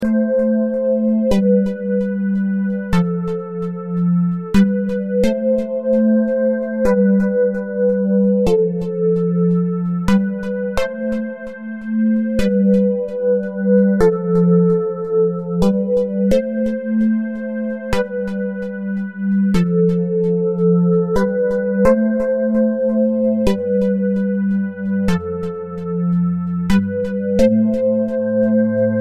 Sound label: sad love tone